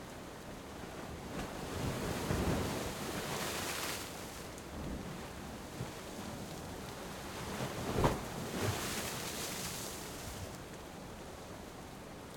Heavy waves on rocks near field rain, Sirocco Sea Storm - Mediterranean sea